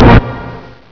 chord

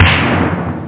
Snare; Drums+Percussion

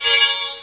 arm force; CD Action; yoda

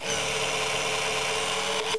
hydrolics; Freeverse Software; Enigma v1.4

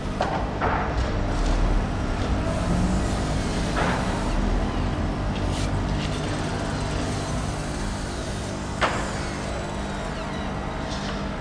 Build Building; ImpGal2; colony

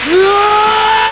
yeaaaaa

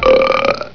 burp

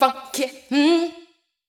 VOICE GRL HOUSE 125 A, House Voice

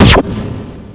arm Bomber; PC Gamer IT CD 48; Big bang

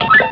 Ground Menu; Star Trek JR Audio

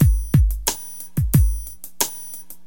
DM LOOP, HipHop, Drums; LiveAct Pool

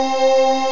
big wind

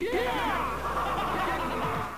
yeahaha

